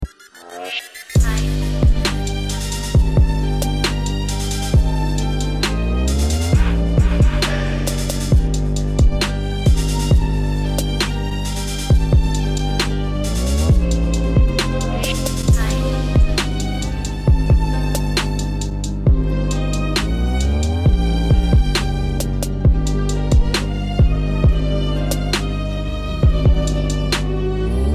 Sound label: epic trap remix; mafia tribute godfather